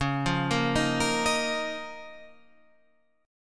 C sharp 6th Add 9th C sharp down Guitar Half Step Down; C#6add9